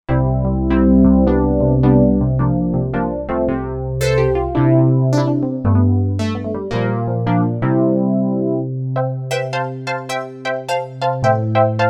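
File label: Pulse Electric Piano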